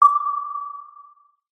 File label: vehicles submarine sonar ping single; zapsplat